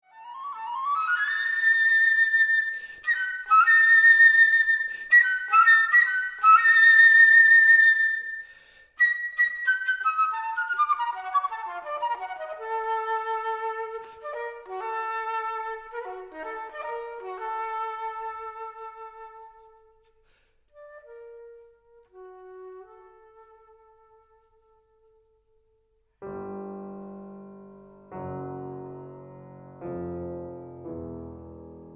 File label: Sonatina For Flute And Piano; Henri Dutilleux